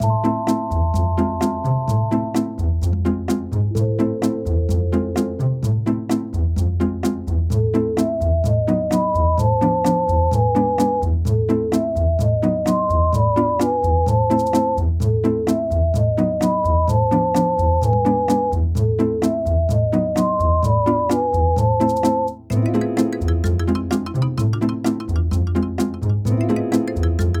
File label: Bgm map; ovenbreak; cookie run